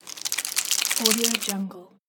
slime splat

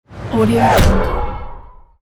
impact

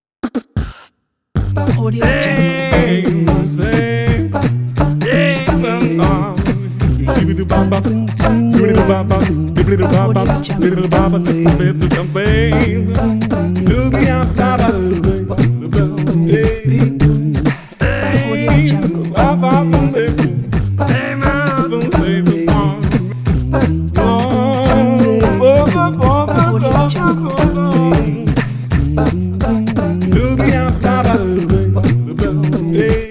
beatbox blues

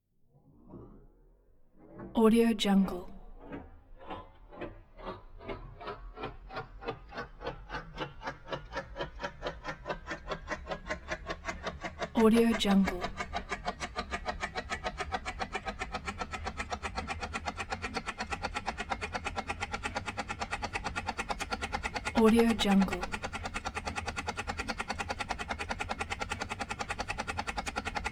transporter

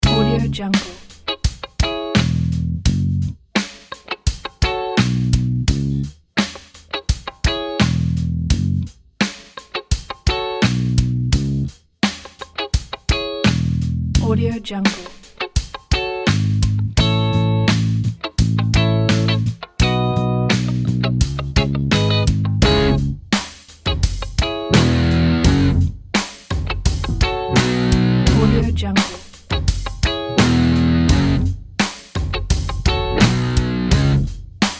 good vibes

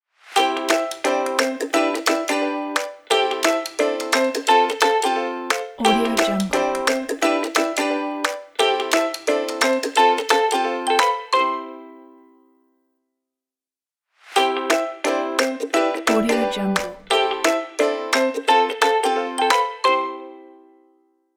happy logo brand ident

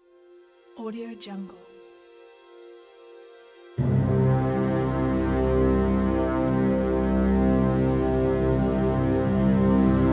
classic hollywood movie logo

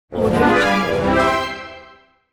cartoon orchestral assignment completed; kuksaudio

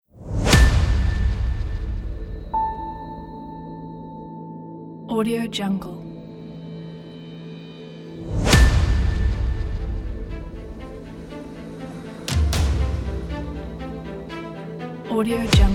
powerful trailer

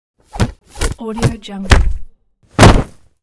martial arts block kick combo

